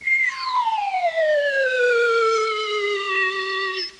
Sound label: whistle down